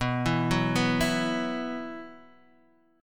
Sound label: Gb 7th Suspended 4th B